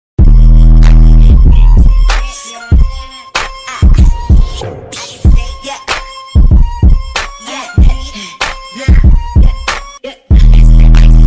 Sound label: arabic remix song bass boosted; tik tok